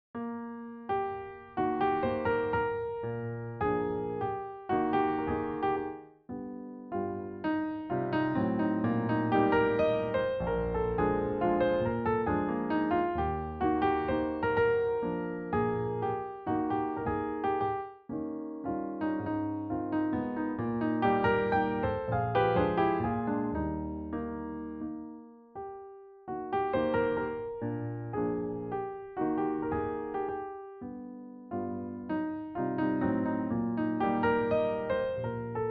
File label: Waltz, chopin